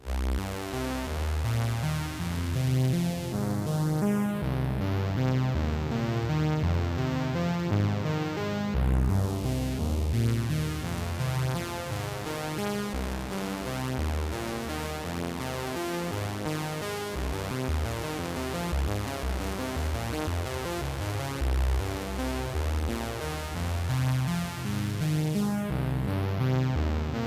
12db Notch; GRP Eurorack

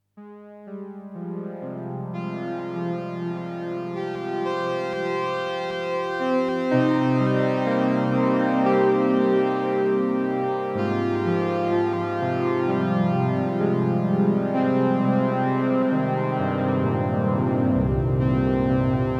ps3100 dual PS

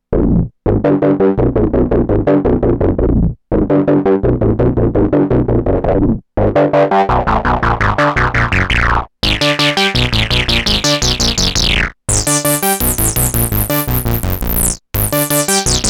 Volca Bass

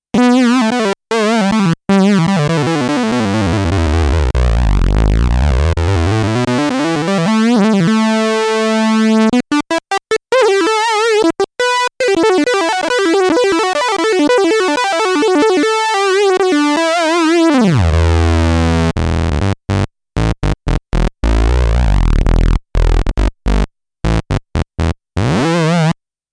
synth soloing shred

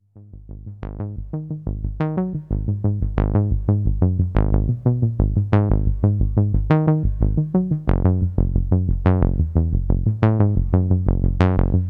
Axxe Bass

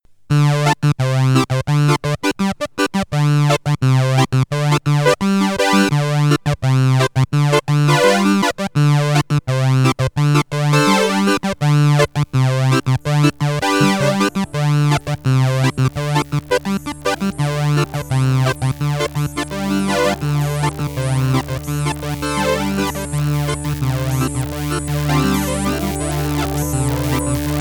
Trident Flanger, synthesizer

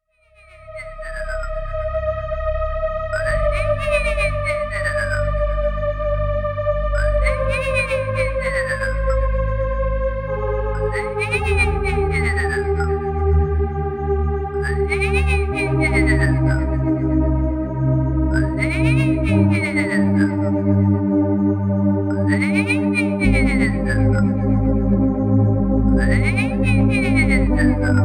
synthex

